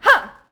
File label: Boxing Female Punch Grunt